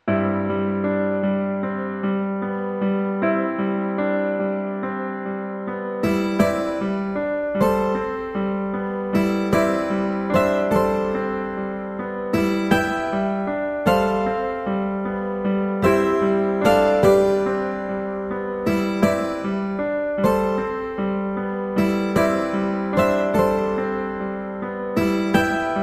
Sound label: New Age Romance Guitar & Piano